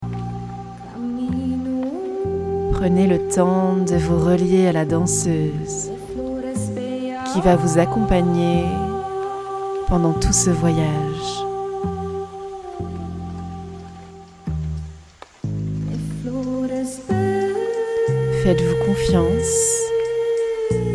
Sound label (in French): danse medecine voix interieure; womoon; pack nouveau souffle